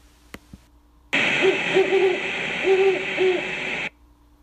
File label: Female